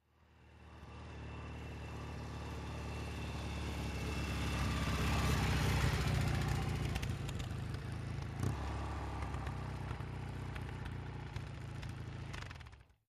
Motorcycle, Norton, In Slow, Stop Distant, Off.

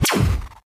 Laser Fire

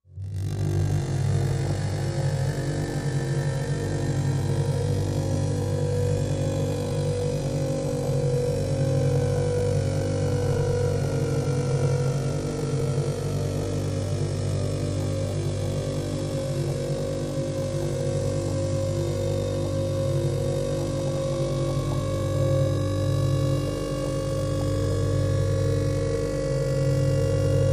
Magnetic Resonator, energy beams

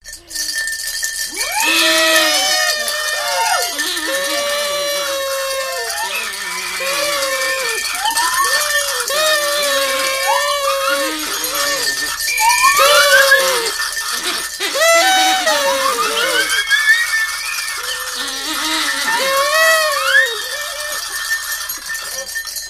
Party Noisemakers

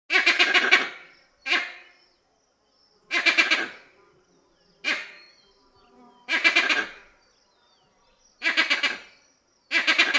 Monkey Vervet Calls, vervet

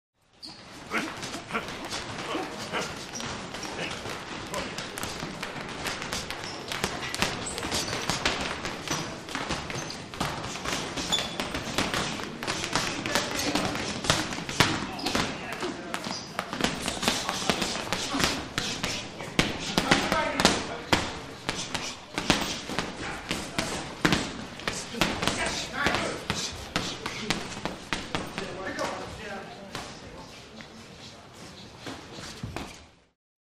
Boxing Gym Ambience

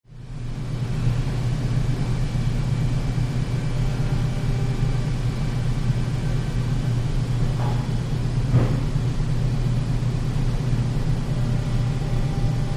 Dist Power Paper Plan, industrial ambience

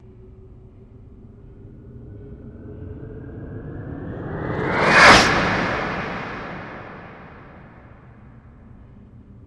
Starship By Whoosh

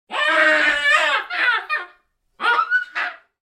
Baboon Scream Two

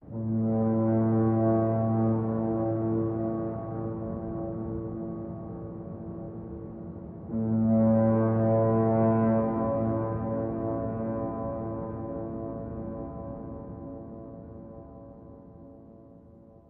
Horn Fog Ambient

